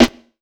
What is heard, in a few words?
snare, drums; USB Soundscan vol.03 - Pure Electro; AKAI